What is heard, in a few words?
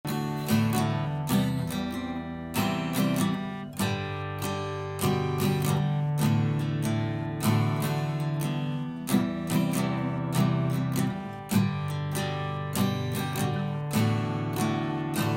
Guitar